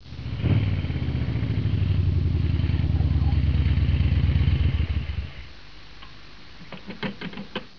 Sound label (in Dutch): stoppen, moto; geluidsfragmenten